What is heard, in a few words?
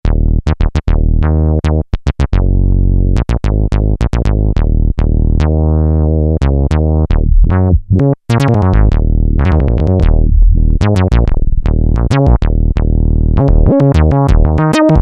JP-4 Rezo Bass, Roland JD-990; Vintage Board